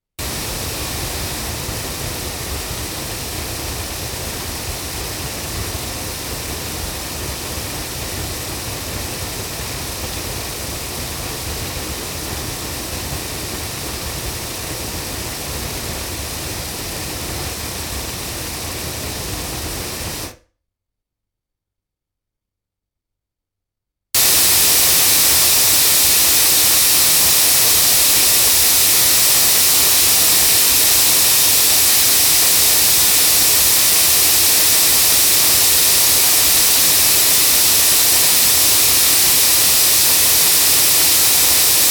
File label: 8 loudspeakers